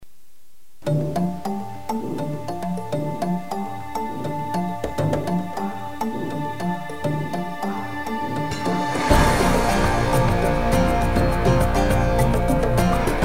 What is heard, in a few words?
EKG; Mega Music Tracks V1; Sound Effects